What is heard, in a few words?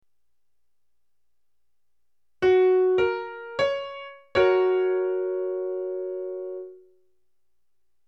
triad